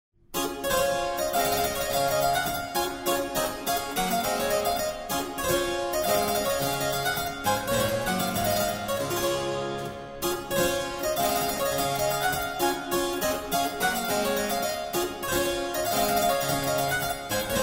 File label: ouverture d major; house master böhm